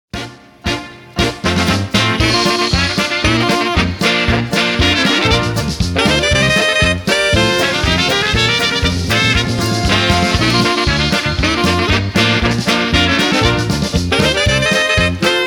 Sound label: Polka